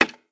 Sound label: Shishi Odoshi; blue archive